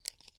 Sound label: Glasses; MiSide